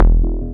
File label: ANALOG TAP; Orbit; E-MU Formula 4000 Series Vol. 5 – Protozoa